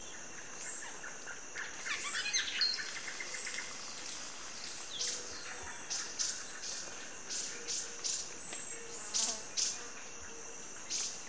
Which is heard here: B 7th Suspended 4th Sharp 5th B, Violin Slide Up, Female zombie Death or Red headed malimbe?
Red headed malimbe